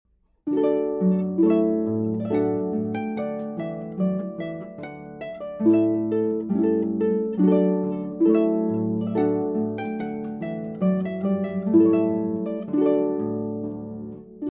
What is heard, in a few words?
Trumpet Voluntary